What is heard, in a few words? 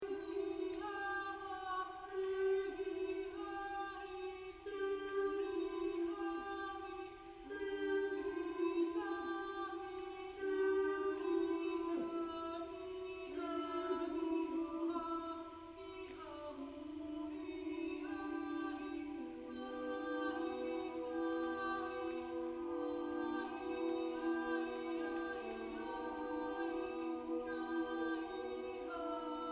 Polyphonic Piece